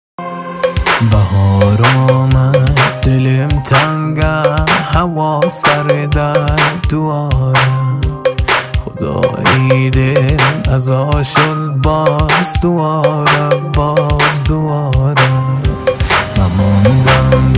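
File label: Lori happy wedding remix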